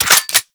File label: Reload; Gotva Prime; Weapons